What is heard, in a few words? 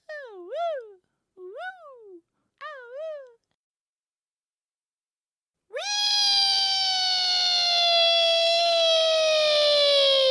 awoo reee